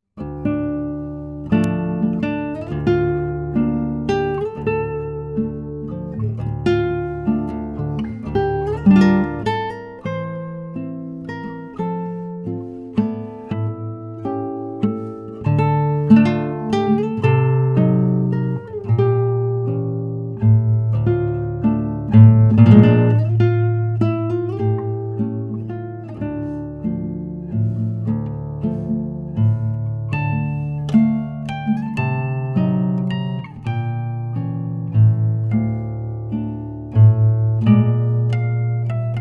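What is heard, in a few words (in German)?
guitar; trauermusik; Gitarre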